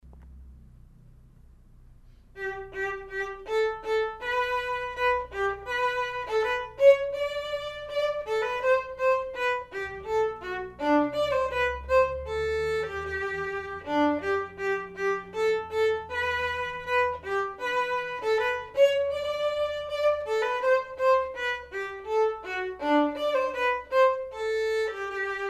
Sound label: vln perf; Building Technique with Beautiful Music, Book 1 Violin